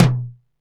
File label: CONG TOM, CONGA BONGO; Techno 138 BPM; Best Service ProSamples vol.54; AKAI